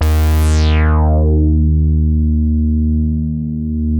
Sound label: D#3, Bass; E-MU Producer Series Vol. 6 – Analogia Project (CD 1); Boom da Bass 2